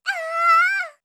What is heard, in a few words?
aaaa; hidan